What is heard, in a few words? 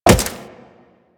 Wall Jump Default; Footsteps; Warframe; Tenno; Wall Run